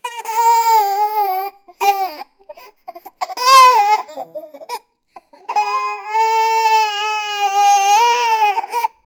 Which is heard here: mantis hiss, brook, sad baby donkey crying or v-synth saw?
sad baby donkey crying